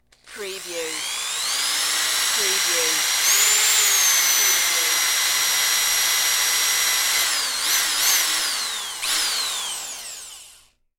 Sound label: Hand Drill